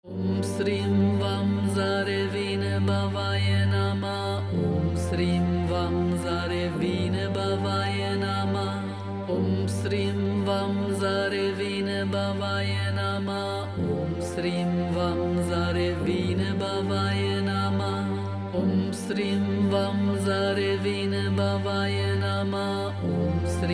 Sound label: Om Srim